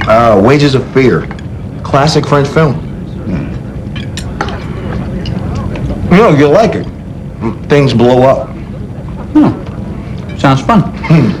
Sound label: Things Blow Up; trek